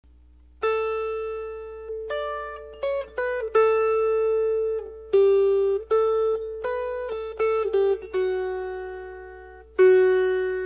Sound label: Melody